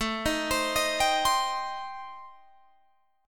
A-Minor 7th Flat 5th, Guitar Open G, chord

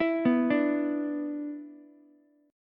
B-Minor Double Flat 5th B down Ukulele; Bmbb5 arpeggiated; JGuitar

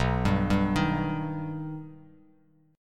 B Augmented B Bass Standard, chord; arpeggiated; JGuitar

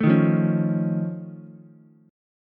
F Augmented 7th F Ukulele, chord; F+7 strummed; 2,3,1,4; JGuitar

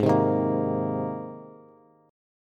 Ab Major Add 9th Ab Bass; Abadd9 strummed; JGuitar

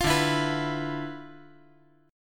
D Minor 6th Add 9th D

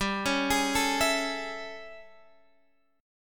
A Major 7th G

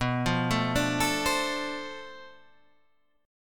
B Minor 7th Flat 9th Flat 5th B, guitar chord

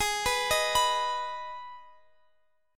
Ab Minor Ab down Guitar Drop D; arpeggiated

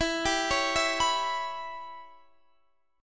C sharp Minor 7th Flat 5th E

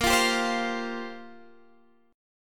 F Suspended 2nd Suspended 4th Bb

